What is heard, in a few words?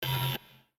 INCORRECT buzz